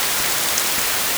pond

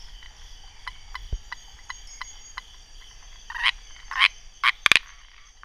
Mercurana

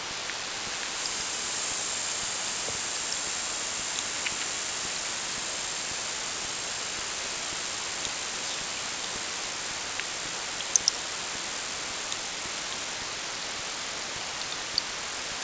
pond

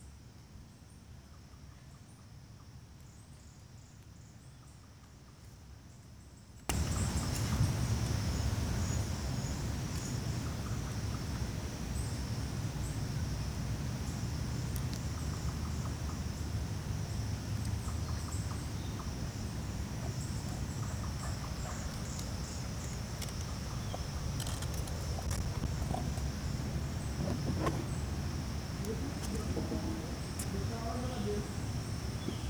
Brachycephalus sulfuratus; Nucleo Itutinga Piloes; MRBornsch

